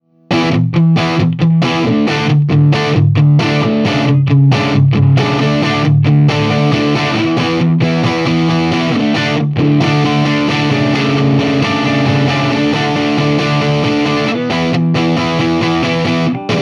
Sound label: DIRTY; TC15